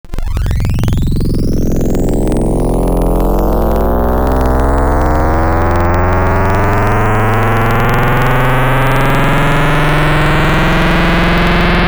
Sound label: Squeeze, Xfer Serum Wavetables; Synthetic's Serum Collection; Cymatics - Nuclear for Xfer Serum Wavetables